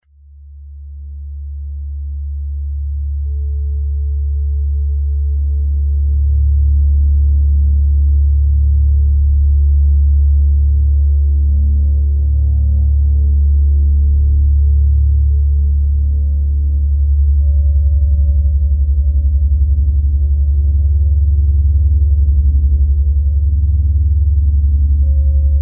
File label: Meditation Song Theta 40 Hz, brainwaves